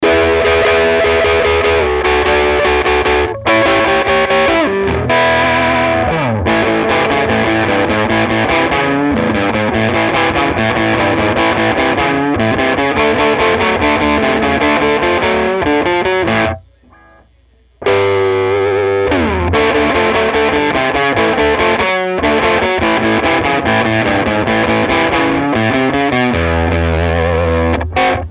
Tweed Deluxe Dirty, 'Dirty' tweed Fender Deluxe mic'd w/Reslo Ribbon